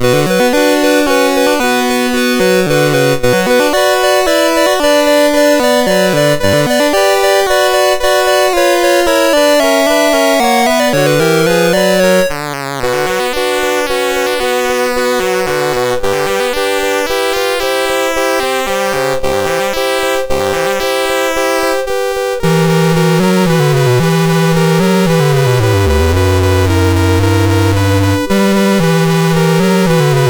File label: Prelude in B Minor Op28 No6, MOS6581R2